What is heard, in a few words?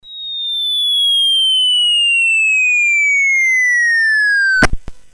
Bomb, Commodore; CSG8580R5; M6 Bomb BASIC